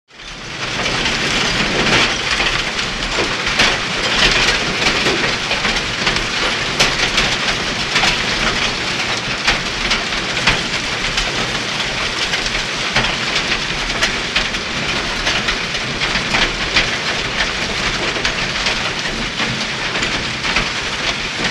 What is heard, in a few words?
Car Crushing Plant, gears